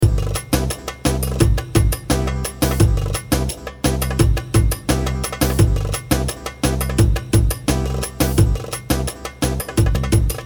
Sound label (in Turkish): ritim